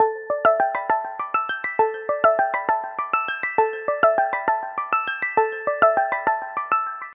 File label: stars iphone 8 ringtone